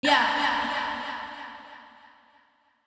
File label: Vocal; Kount Drums Vol 5